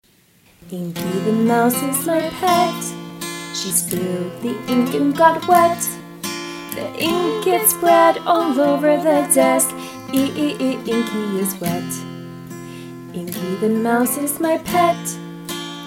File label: I song harmony, phonics